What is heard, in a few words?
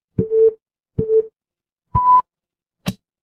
ui countdown